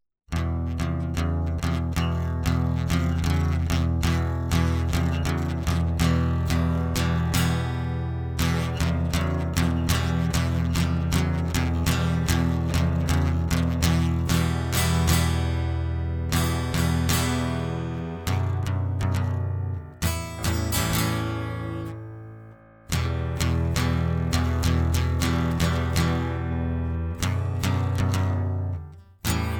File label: Instrumental